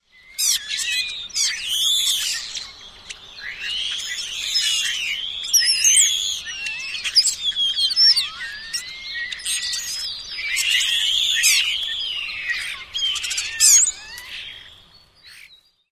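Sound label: European Starling wheezy sounds and other calls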